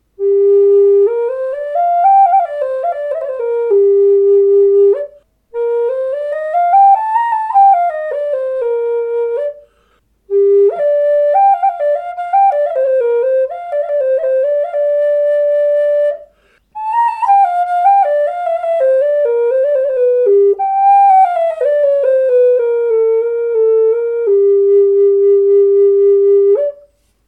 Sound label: G4 Spruce, flutes; G4 half-pipe in